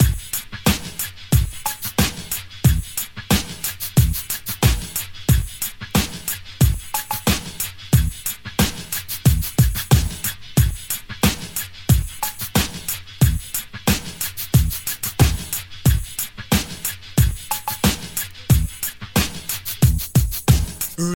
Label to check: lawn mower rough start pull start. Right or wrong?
Wrong